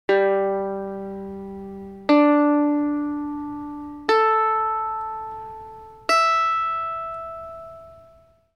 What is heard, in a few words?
tuning check mandolin; Hear all strings in sequence